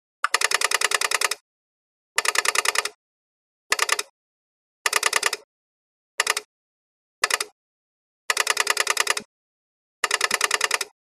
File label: Phone Dialing Rotary, foreign